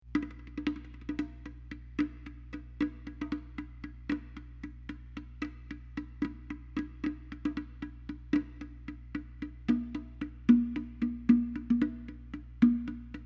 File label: bongo